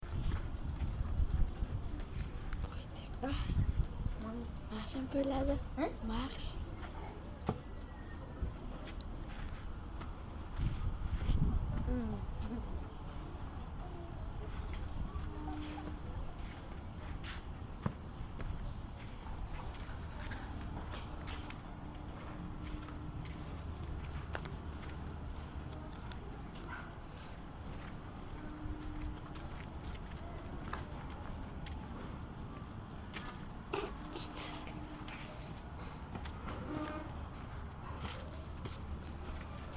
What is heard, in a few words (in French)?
oiseau pompe a eau rires